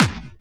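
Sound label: hard bass drums; Bazzdrumz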